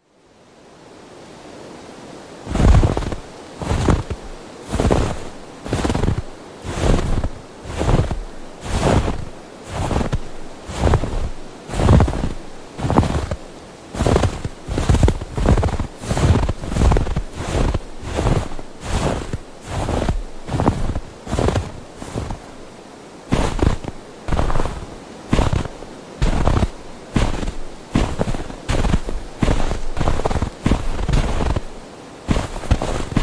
walking in snow; راه رفتن در برف

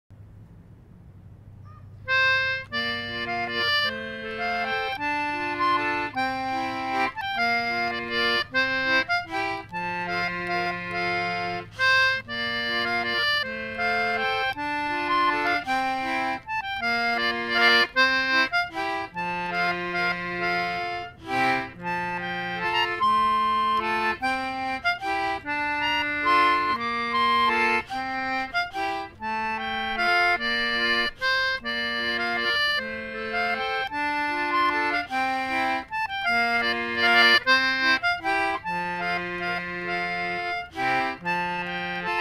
Concertina; کنسرتینا

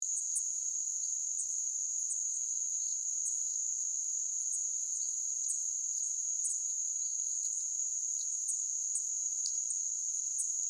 Cuban grassquit

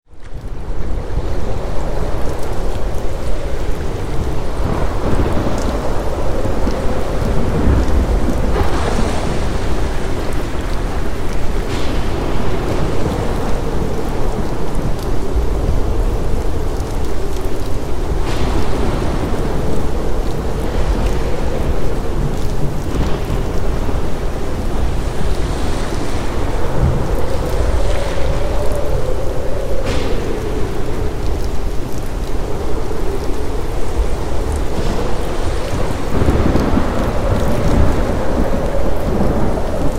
epic storm thunder rain wind waves no loops

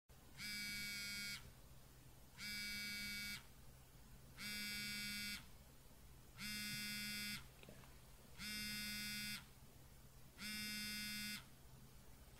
silent ringtone

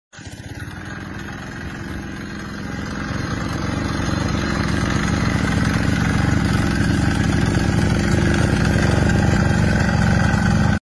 Agricultural tiller

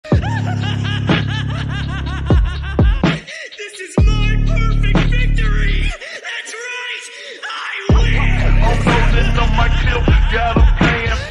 laughs; Light Yagami; لایت یاگامی

